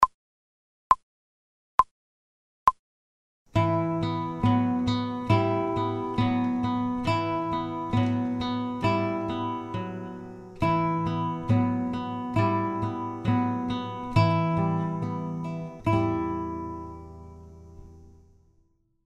fast, guitar, without metronome

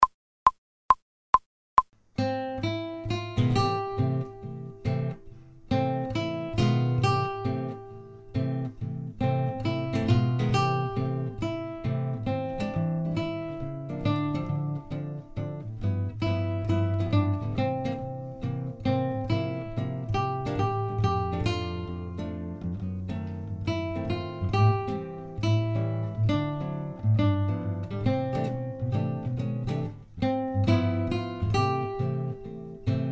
fast, guitar, without metronome

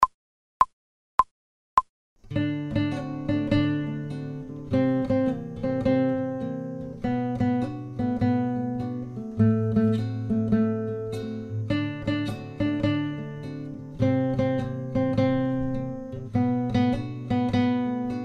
Buffalo Gals fast without metronome, guitar; Buffalo Gals Study

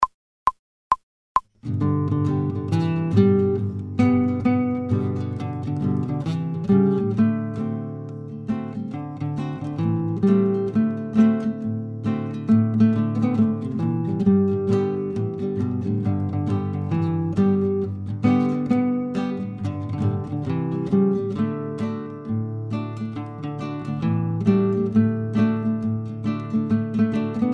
fast, guitar, without metronome

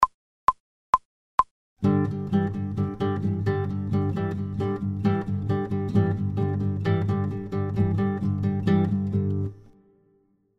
Another Rock Groove fast, without metronome

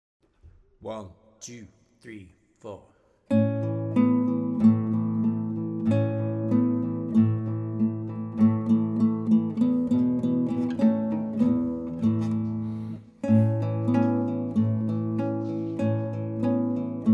in A, guitar; Hot Cross Buns

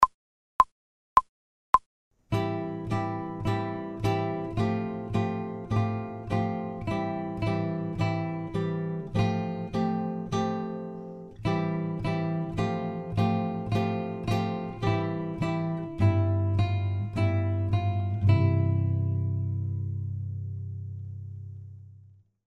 fast, guitar, without metronome

